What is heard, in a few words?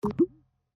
Notification